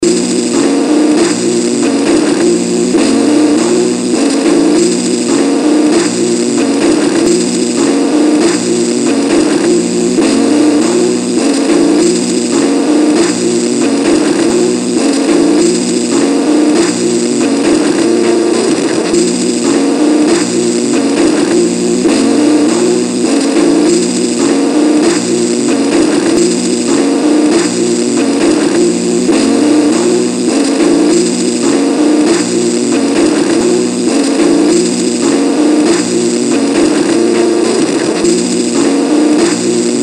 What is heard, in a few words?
HMP-139 song, Circuit Bent